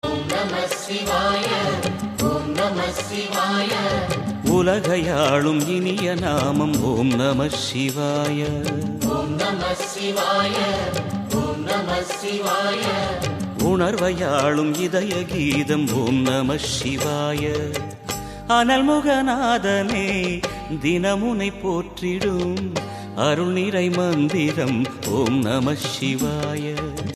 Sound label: Om Namah Shivaya Ringtone